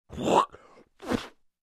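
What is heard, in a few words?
human spitting sounds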